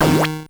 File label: multiplier D#4